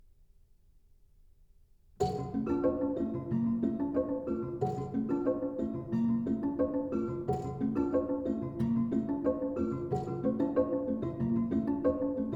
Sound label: Sonatas and Interludes for Prepared Piano